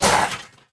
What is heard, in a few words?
death soldier damage